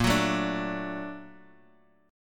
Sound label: Bb Augmented Major 7th Bb down